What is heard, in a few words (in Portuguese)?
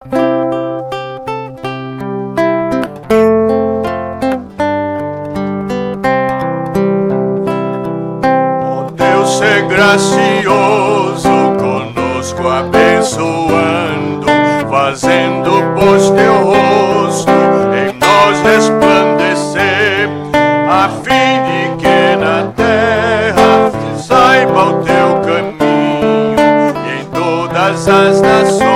salmo cantado